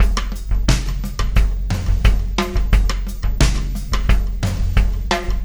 Beat, Loops; Sonic Foundry; Not Just Another Pretty Bass; AI Loops - Siggi Baldursson

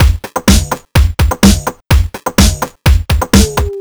Full, Drum Loops 126bpm, Nu Dance Electro; Zero-G; Soundclash Nu Dance Electro; Acidized Wavs; Nitty Gritty

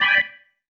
Stab One Shots, Organ

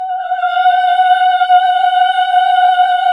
HAL AAH, Nuns Aahhh; Sound & Vision - Gigapack II CD 1 (Roland); HAL NUNS AAH OOH

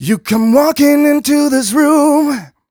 m 127 mel a, Vocals

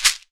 SHEKERE, LATIN percussion; Voice Crystal - Steve Reid Definitive Percussion; LATIN SET

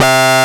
SYNC WAH, Jupiter Synths; E-MU Formula 4000 Series Vol. 3 – Analog Odyssey

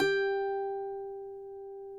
cuatro; Spectrasonics; Hans Zimmer Guitars VOL-2

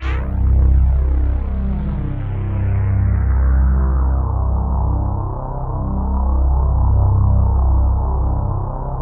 RICH HARM; Greytsounds - Scott Peers Sound Engineering

